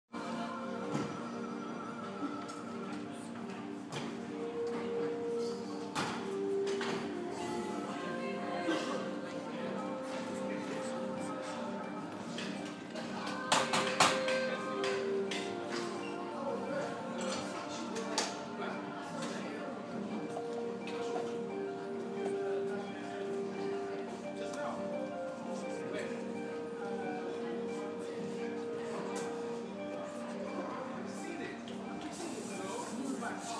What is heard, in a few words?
ambient opera